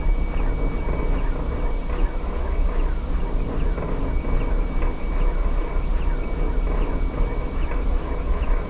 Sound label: FAN; Gunlok; environ